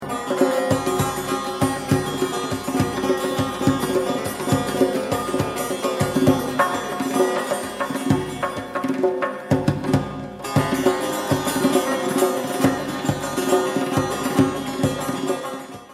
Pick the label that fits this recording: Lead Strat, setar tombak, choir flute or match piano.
setar tombak